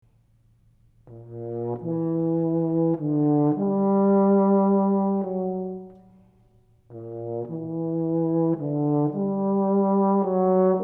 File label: Euphonium High School